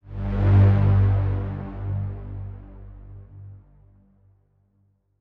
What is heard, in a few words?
Chime; chime sharp